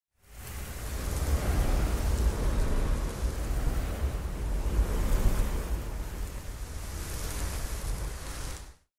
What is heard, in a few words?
Particles